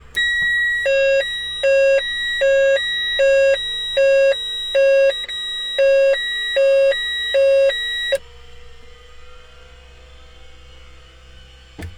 Cessna 208, Fire Detection Alarm; Cessna 208 Caravan 1995; Telinga

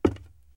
woodpanel, player footsteps; hl2

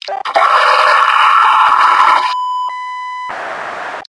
fire scream; hl2; metropolice

